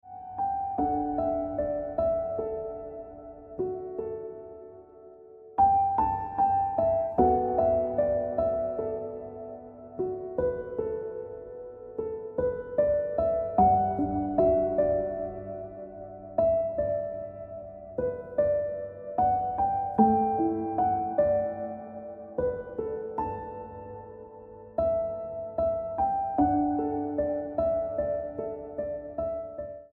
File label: Piano Music